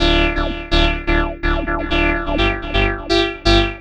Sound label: Lead